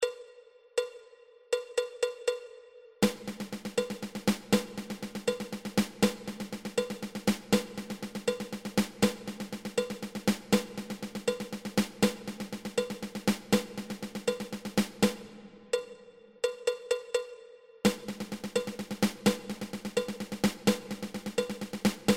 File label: Ten Stroke Roll